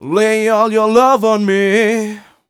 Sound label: m 127 mel a, Vocals